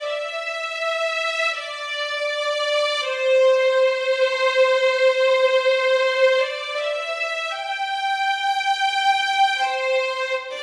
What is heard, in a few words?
violin